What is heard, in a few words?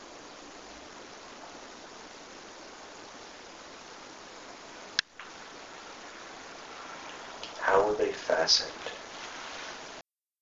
evp click